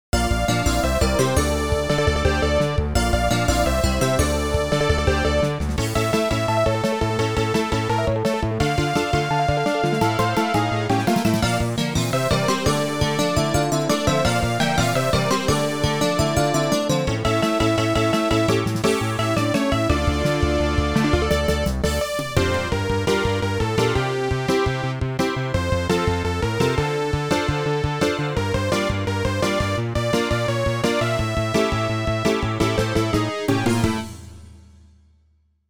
Retro Wolf Hurricane; Legend of Dragonballs

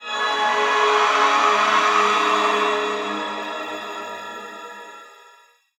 scream long; radiance; Hollow Knight

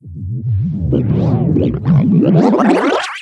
Jelly Cake Charge; candy crush jelly saga gamerip

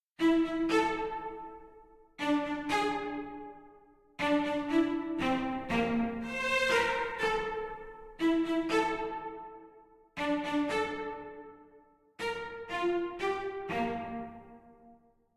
cello; Toki Tori Collection

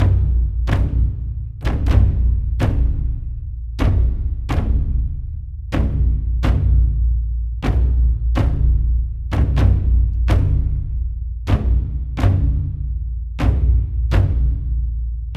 Drums; For Honor

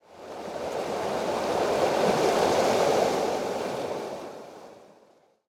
waves; Level2 Ice Fields

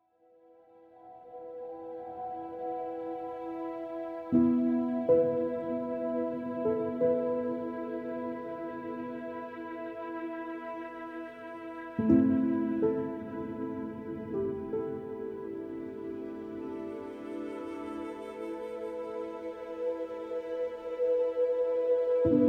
Sad After Storm Loop; There Is No Game: Wrong Dimension

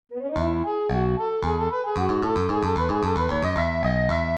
Game Start; Ms. Pac-Man; j2me

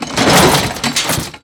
Shutter Up

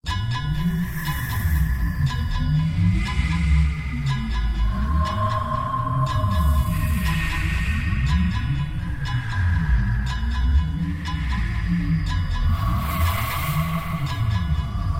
bgm zone laststage; zone5; goken

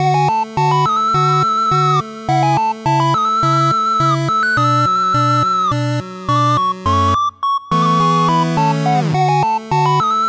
Background Small Village; dwellers empty path

